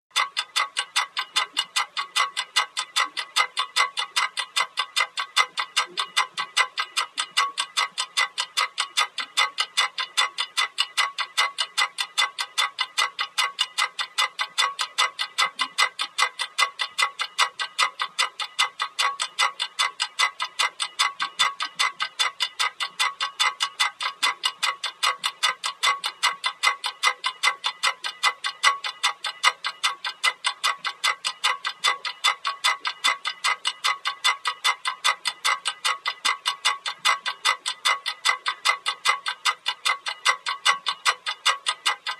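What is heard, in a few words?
Small Mantle Clock Tick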